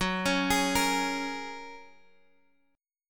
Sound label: Gb Major Add 9th Gb, Guitar Drop D; chordshape; down